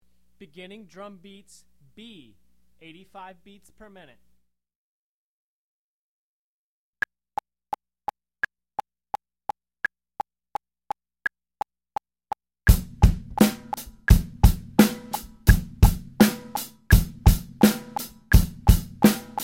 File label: beginner drum beats; Drum Lessons